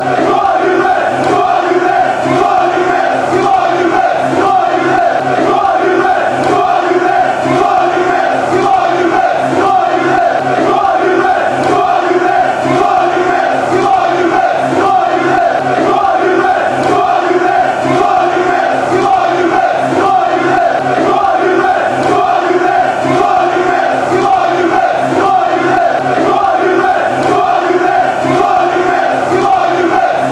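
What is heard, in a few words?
come on you reds fast, football chants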